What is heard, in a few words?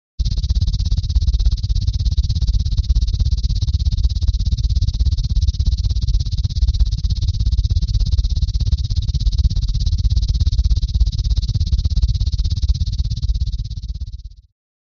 Noise Menacing Disto